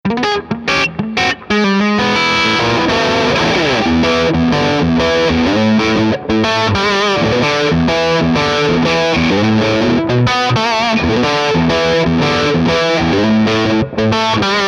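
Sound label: Rockerverb Dirty Gibson SG Blues; RK50MKII212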